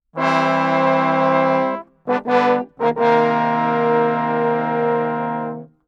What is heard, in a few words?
85 CmajCmin all, Brass; 90 s Sample CDs; PowerFX - Downtown Orchestra